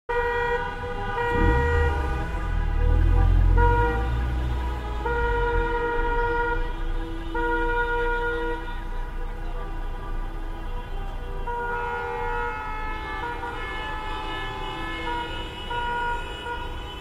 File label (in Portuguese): buzinas